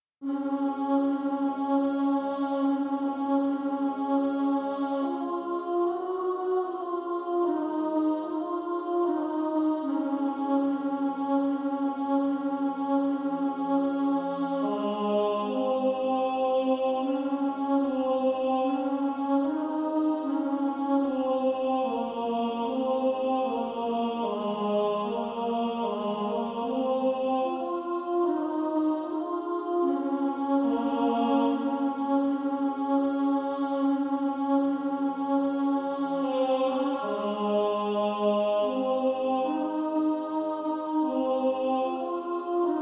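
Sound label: Female Choir, alto 1; SSAA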